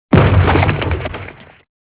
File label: Explosion